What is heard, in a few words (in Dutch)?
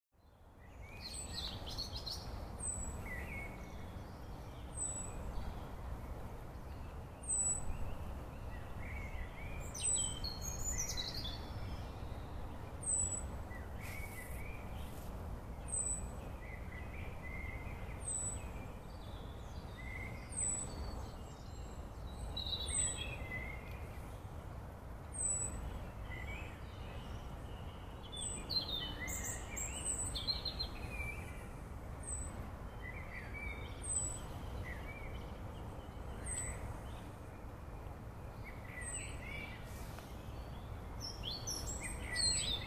vogel